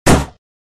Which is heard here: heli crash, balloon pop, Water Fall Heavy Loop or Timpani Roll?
balloon pop